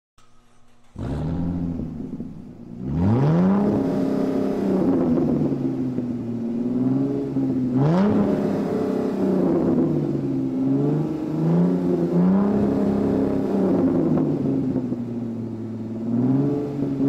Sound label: Supercar Engine Revving